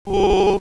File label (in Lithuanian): vibrato greiciau, balso akustika